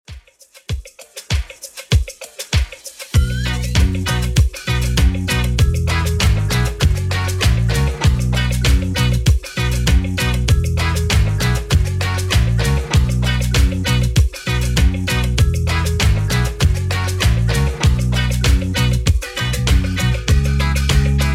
80s Redrum 98bpm

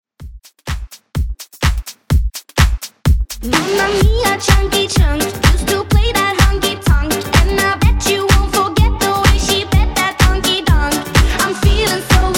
Trombone Dance Redrum Clean

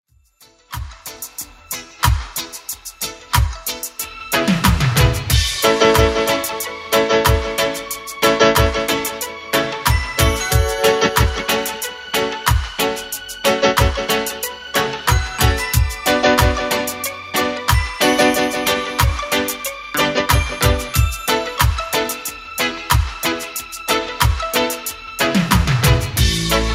80s Reggae Extend Redrum 92 bpm CLEAN

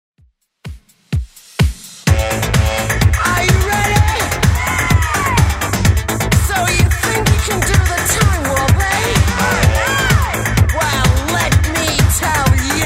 80s Redrum 127bpm

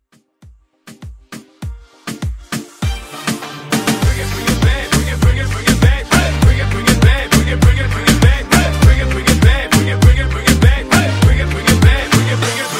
Moombahton Hype

